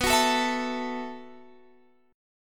B 7th Suspended 4th B down Guitar Standard; B7sus4 strummed; capo=3

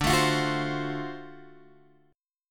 D 7th Sharp 9th D, chord; D7#9 Chord {10 X 7 10 7 8} strummed

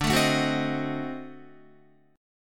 D 7th Flat 9th D down Guitar; D7b9 strummed; JGuitar

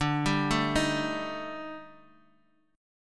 D sharp Major 7th Flat 5th D, chord

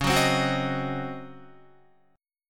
Db Major 7th Suspended 2nd Suspended 4th Guitar; 9,9,10,11,12,10; DbM7sus2sus4 strummed; JGuitar

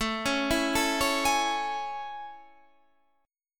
A Major 7th A; AM7 arpeggiated

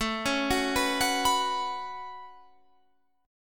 A 6th Add 9th A down Guitar Half Step Down

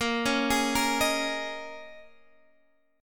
Bb Minor 11th Bb

